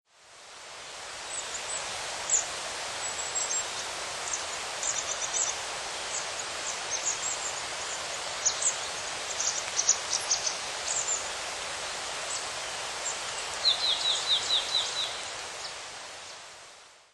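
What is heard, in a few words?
hashibutogara, Marsh Tit; koe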